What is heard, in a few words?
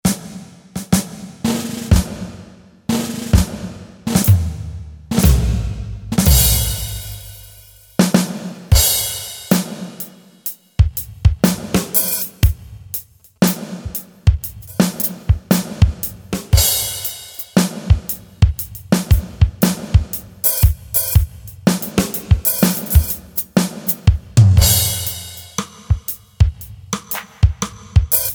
Standard Kit; Yamaha PSR-550; Voice